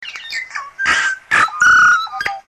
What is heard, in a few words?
tui